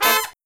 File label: HI CHORD, Brass; USB Soundscan vol.29 - Killer Brass Riffs; AKAI; HIGH CHDS